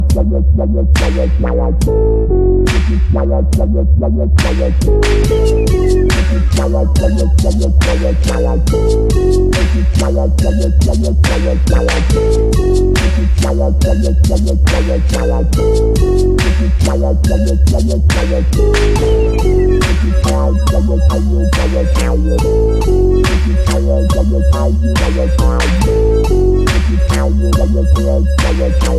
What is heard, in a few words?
Dubstep Instrumental